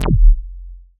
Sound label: bass F1, Roland MKS-80